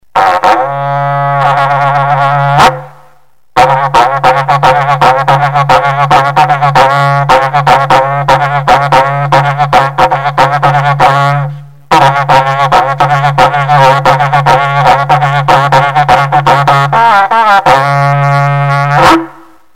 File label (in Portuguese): Toque Berrante; Rodeios; Esportes; estradao